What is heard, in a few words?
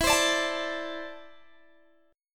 E 7th Suspended 4th Sharp 5th, Guitar, Open G